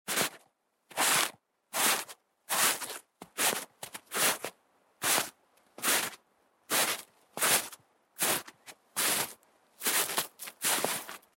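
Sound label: sound of footsteps on snow; santa klausa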